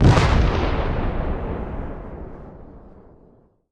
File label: Korg NS5R Explosion